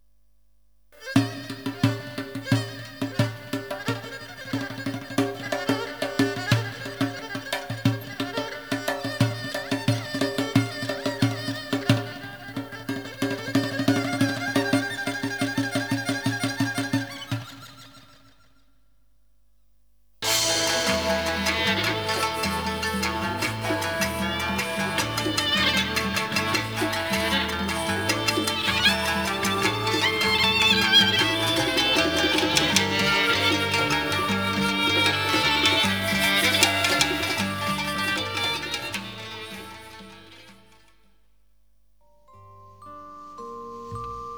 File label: dnb